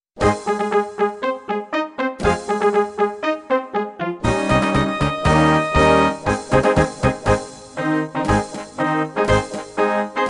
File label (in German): Marsch